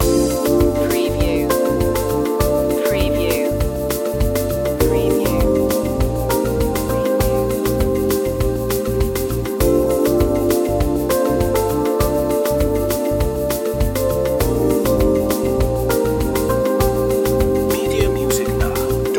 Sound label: bossa girl loop